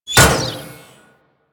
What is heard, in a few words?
Kunai Impact Default; Warframe; Hit Effects